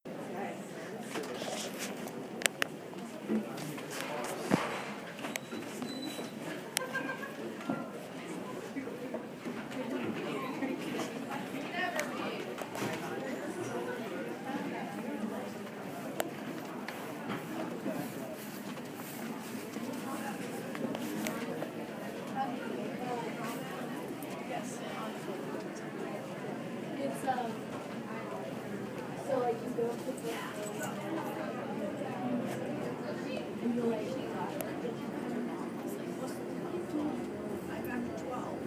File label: Library Soundscape; Bailey Howe